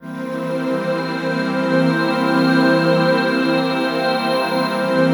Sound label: PAD, Drum & Bass, 135 BPM; Ueberschall; Drum & Bass Resonance